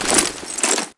ammo pickup; Counter-Strike Global Offensive; items

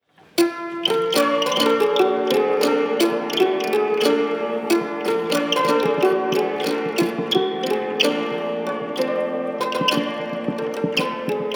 Pianoharp, Mechanical Instruments; Swedish Table; Speelklok Museum; Andersson

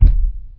thumper single; Emperor - Battle for Dune

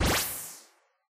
lightning; Alawar; StarDefender4

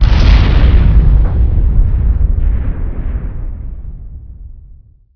big xplosion; Emperor - Battle for Dune

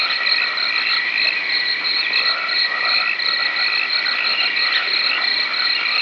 frog cricket loop, swamps; ambient; Left 4 Dead 2

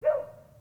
dog; AssaultCube; Alien Stars; American Muscle Series

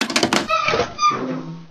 lever, buttons; Garry's Mod; hl2

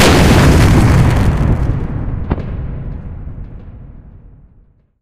firewerks burst; ambient, atmosphere

